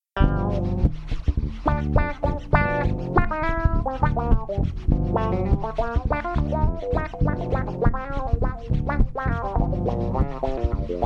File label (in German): zugabe solo